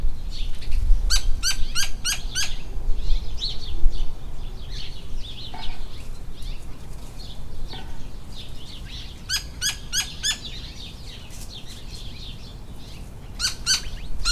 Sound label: Gila Woodpecker